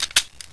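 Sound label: 357 cylinder; weapons; svencoop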